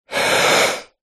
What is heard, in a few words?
male inhale deep breath; human; zapsplat